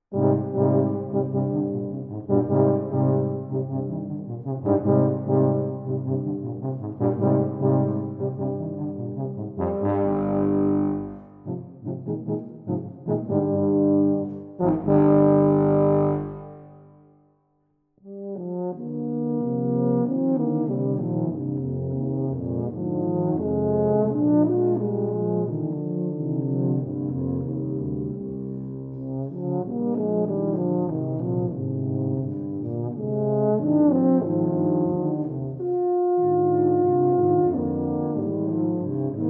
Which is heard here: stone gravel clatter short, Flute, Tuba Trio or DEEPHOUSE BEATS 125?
Tuba Trio